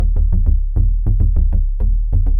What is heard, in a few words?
Sub Bass Texture; synth loops